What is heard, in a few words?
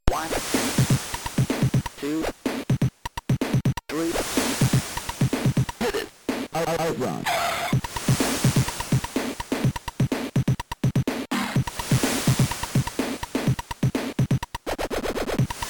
c64 emulator